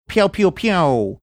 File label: pew pew pew